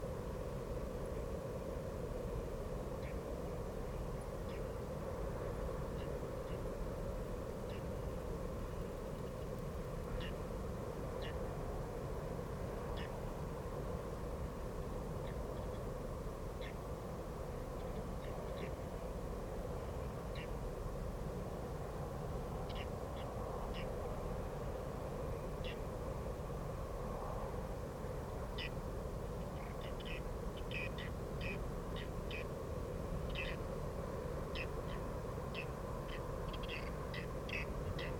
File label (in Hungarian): fattyúszerkő hangja